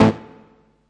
stabs hits

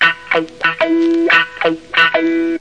wah guitar

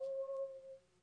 whirly tube C3 soft

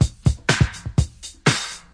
124 bpm swingy double kick, drum loop

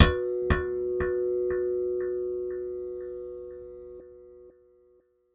harmonic echo bass; Basses

